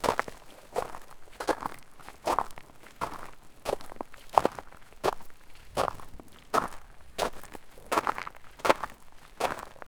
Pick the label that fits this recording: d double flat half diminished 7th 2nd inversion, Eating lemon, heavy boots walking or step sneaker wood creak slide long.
heavy boots walking